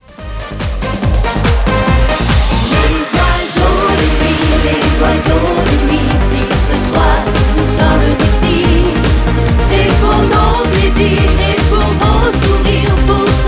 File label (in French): Sonnerie; Oiseaux de Nuit